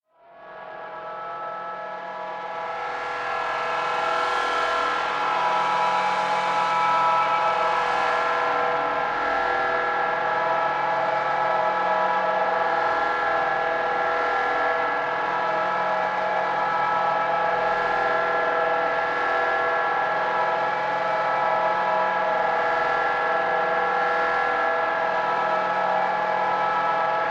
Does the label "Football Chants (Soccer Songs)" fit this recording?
No